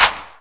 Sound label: Claps & Snares